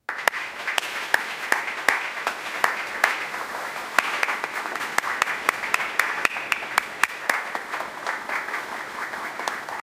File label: claps, audience